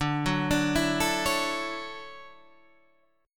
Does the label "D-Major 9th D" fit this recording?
Yes